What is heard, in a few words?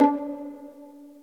P ENSMB D4, STEREO PIZZ; Greytsounds VOL-1; STEREO PIZZ2